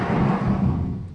BIG DOOR; lander

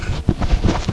Cloth Armor; Realmz

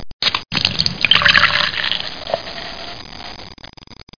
BEER POUR; 2000 Sound Effects

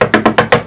knock; aroundhome

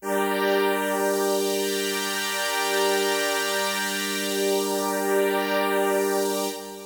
Techno, Melody, A4; Techno Music Maker

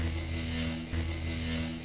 Pink Charge; The War Engine

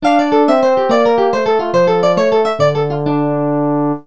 medieva, music; KABOOM! - Wild and Wacky Sound Software

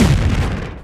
hit

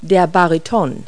baritone; Multi-Lingual Talking Picture Dictionary

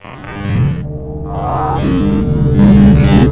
POWER UP; weapons; shadgun

